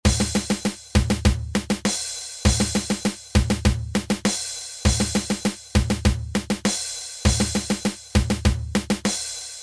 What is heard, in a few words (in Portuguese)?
Toque